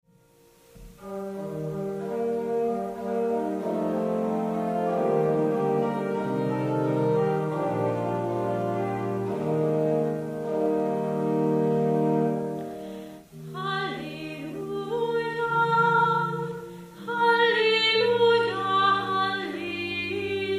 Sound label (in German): halleluja